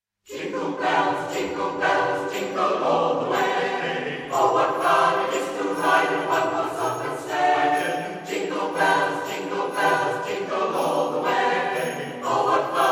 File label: JINGLE